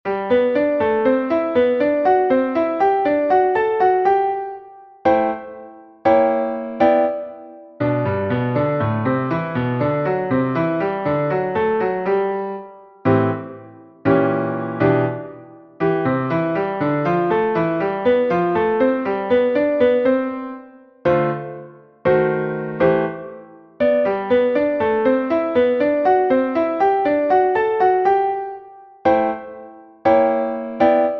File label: Jazz Exercise